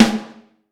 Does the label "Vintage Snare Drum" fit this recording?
Yes